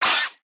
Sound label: howl little monster